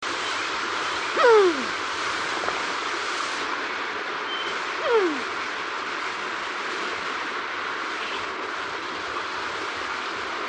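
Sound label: Pigeon, Bird Calls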